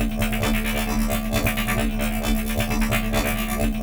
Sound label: RING MD 132, Trance; AMG; Global Trance Mission VOL-2; PROCESEDLPS